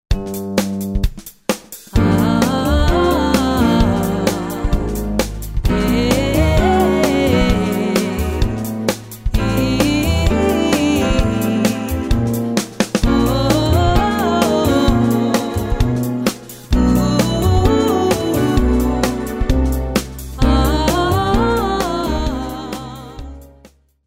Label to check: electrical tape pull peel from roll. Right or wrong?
Wrong